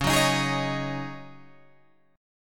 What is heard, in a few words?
Db Suspended 2nd Suspended 4th Db Guitar Open G